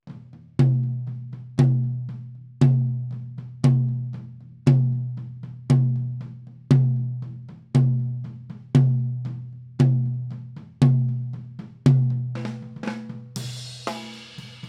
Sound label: Toms